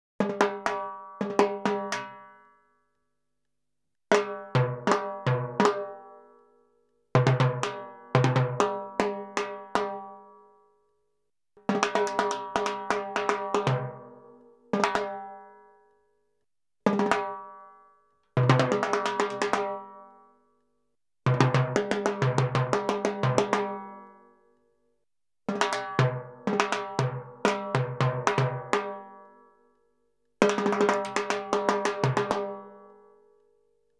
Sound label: Salsa Picante; Masterbits; World Zone VOL-3